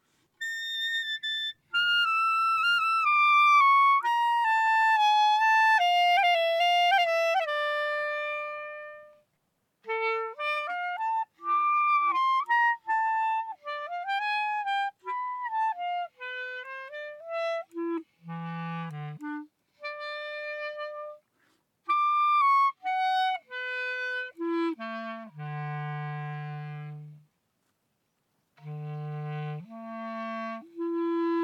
Stand Extrem Hoch Frei Ton Spiel

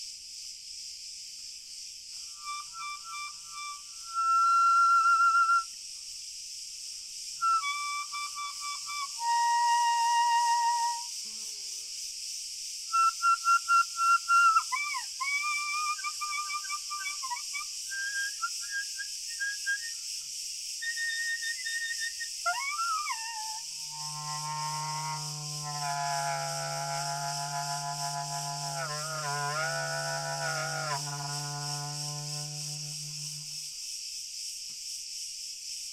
Frei Spiel Grillen

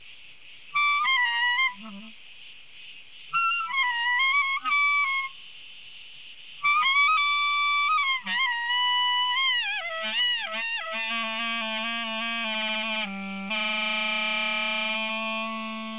Grillen Frei Hoch Reibton Spiel

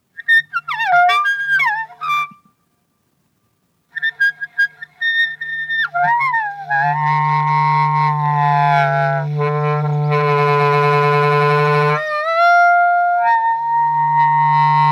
Kriti Vor Karidi Cl Rau Spiel Kurz Int Mik, in den wind spielen; kreta

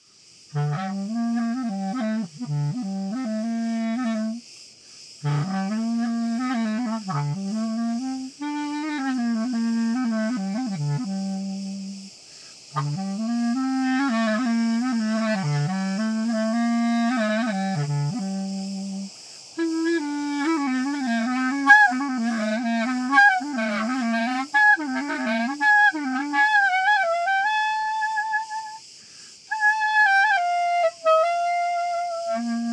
Frei Rau Hoch Ton Spiel

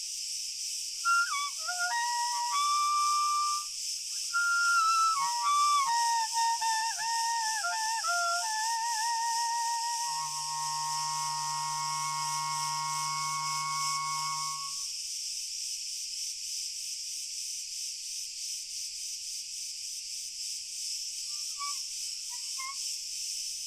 Grillen Chor Cl O Mix Fern

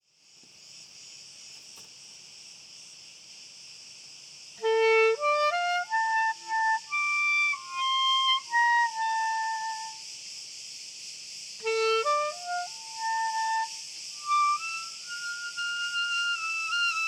Grillen Stand Spiel Tief Hoch Ton Wind

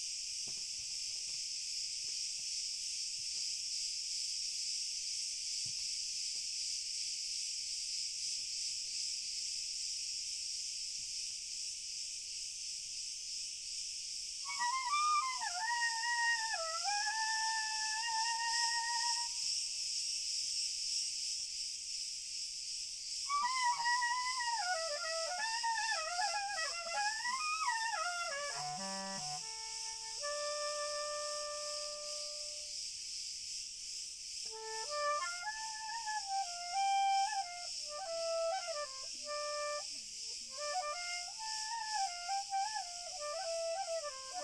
Grillen Chor Sehr Fern; Frei Spiel Stand Mix

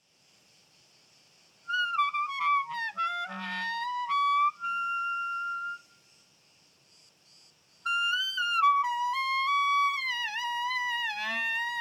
Oberton Spiel Grillen

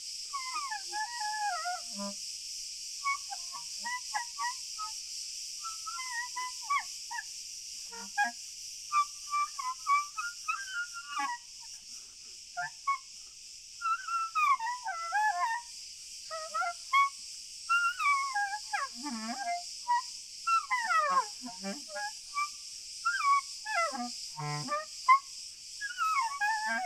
Frei Spiel Grillen

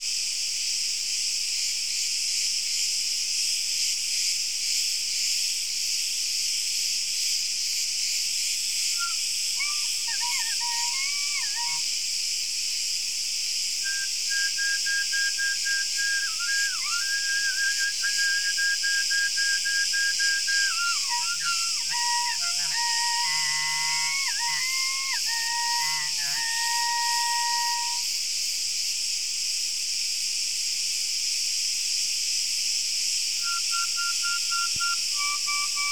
Grillen Frei Ober Ton Spiel

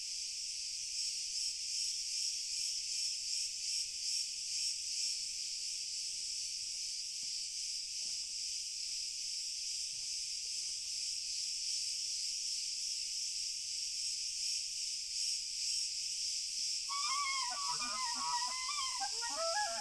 Grillen Chor Frei Spiel Sehr Fern

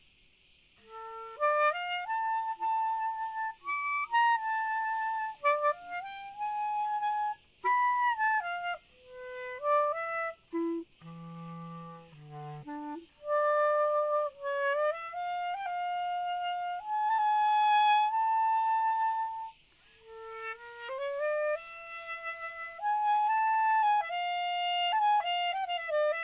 Standard Mit Grillen

